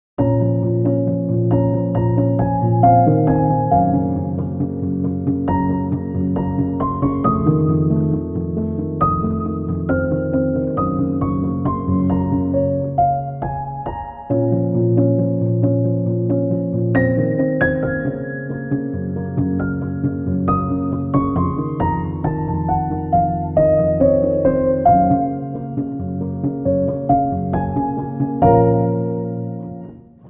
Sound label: gentle prelude piano; 30 seconds; organ